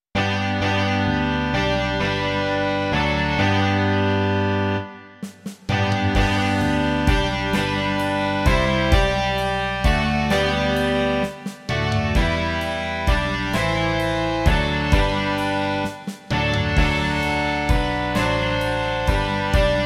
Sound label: rock